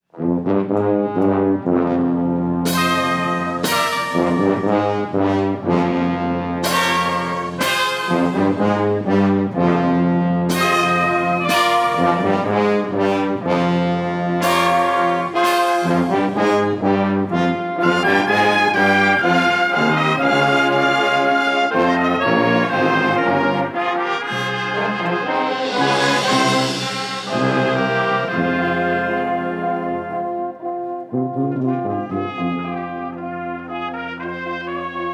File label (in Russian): Духовой оркестр; Курганинск Братская конференция